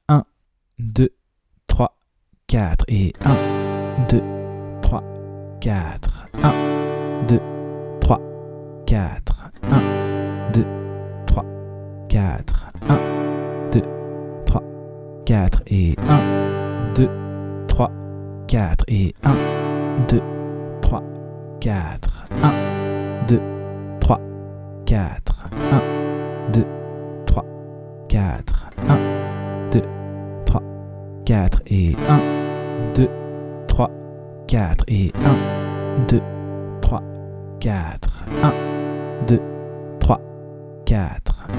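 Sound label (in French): moyen Passer de G à D, suites d'accords; De 0 à vos premières suites d'accords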